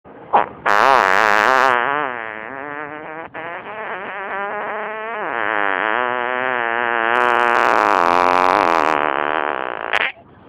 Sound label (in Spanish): Pedo de metro